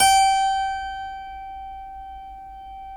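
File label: G4 clavinet right, Keyboards Acoustic; USB Soundscan vol.17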